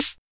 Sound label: Snare, Acetone Rhythm-King